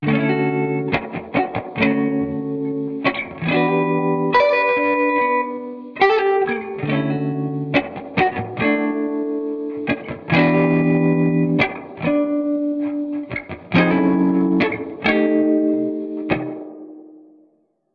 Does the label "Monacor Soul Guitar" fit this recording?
Yes